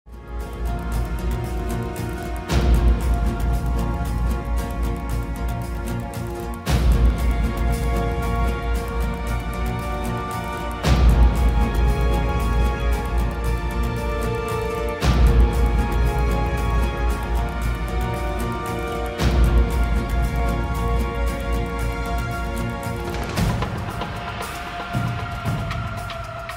Grassland Battle Music; ARK Survival Evolved

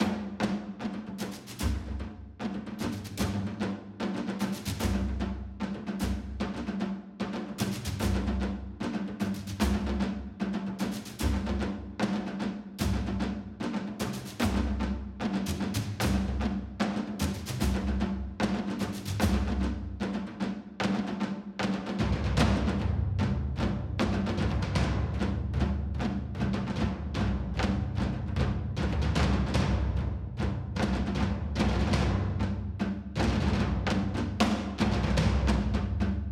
Boss Fight Stem; beast quest; ps4; Ferno

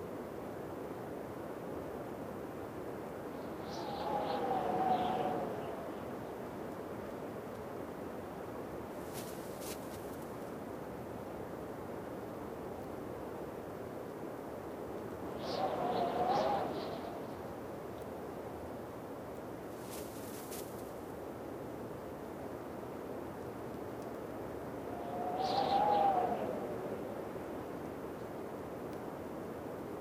Ambience; Ragnahog; Angry Birds Seasons

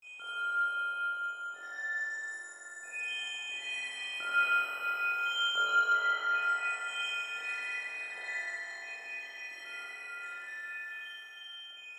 Treasure Chest Emitter; creativerse

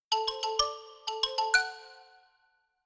licks

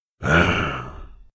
Cultist Notice Noise; Devour

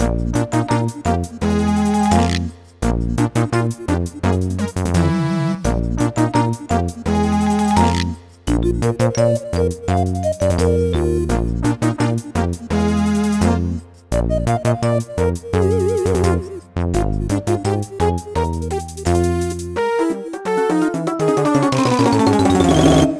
Game Result Fail; Dr. Mario World; Android

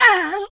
pain

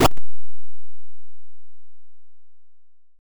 Parasite Spread Wings (Sound); Gloom

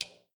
metal ladder footstep; adams venture origins

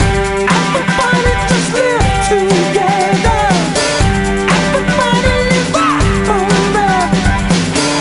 Blues Rock; Happy Feet Two; NDS

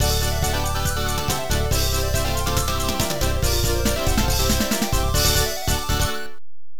Goal

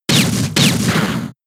Dizzy Punch; pokemon sfx gen 6 attack moves xy oras

